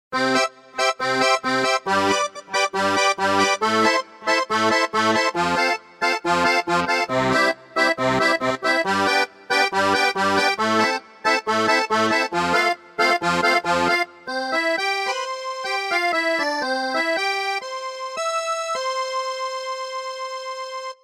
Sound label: Accordion; Ketron